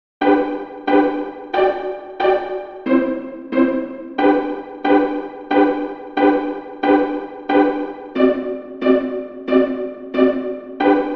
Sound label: The Gloryland Way Chords 180bpm, mandolin